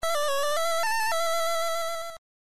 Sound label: flute; zelda; Adventure of Link